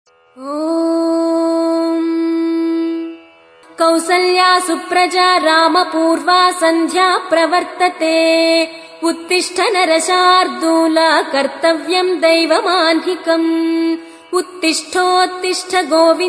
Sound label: morning alarm ringtone, bollywood